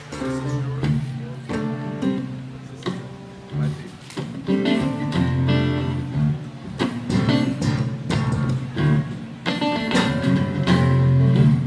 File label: guitar